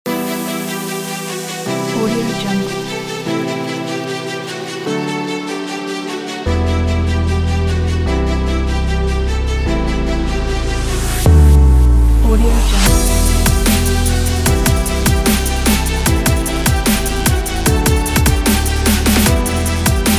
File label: epic dubstep intro music